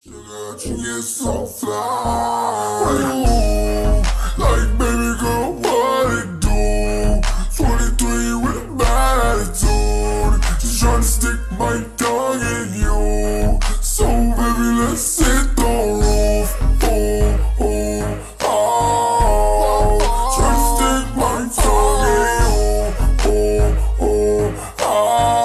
slowed

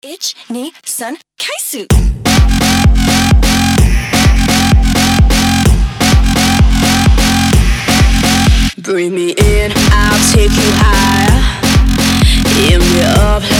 electro house

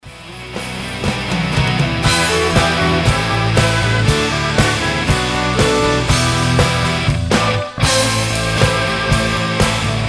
instrumental; rok metal

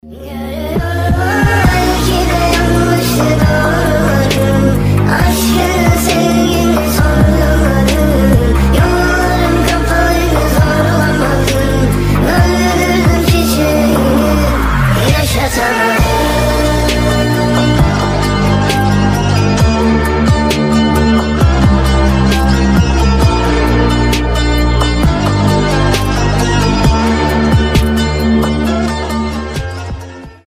speed up remix

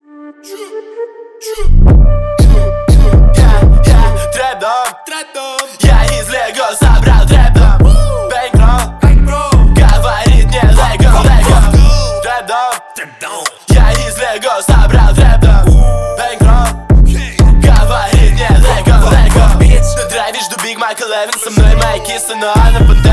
trap; трип-хоп

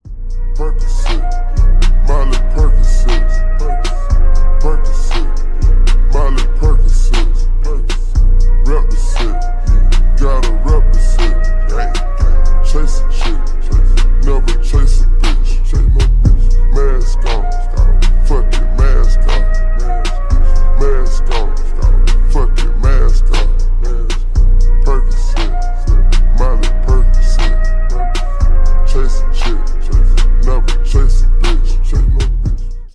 slowed reverb

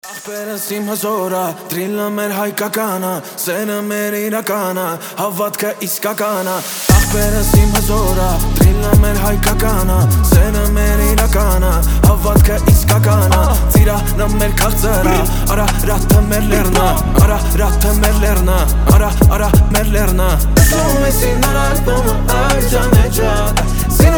drill; haykakan; на брата

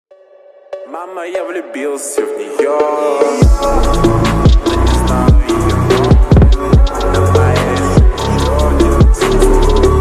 phonk remix

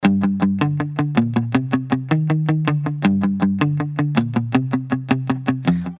two strings; уведомление

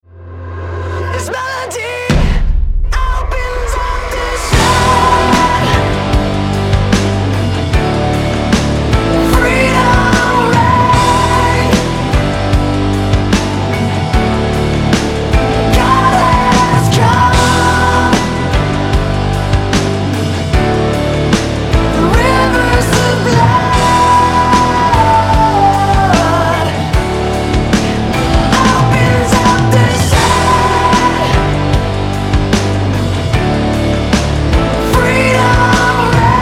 soul song, мужской голос

